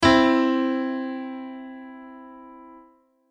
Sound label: c major third harmonic